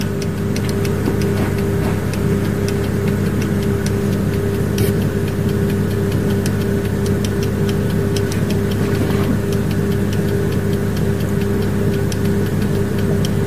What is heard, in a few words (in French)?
Bruit oscillateur